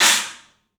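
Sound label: club cymbals; 3D Sound; 3D Drums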